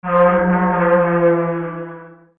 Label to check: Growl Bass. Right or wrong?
Wrong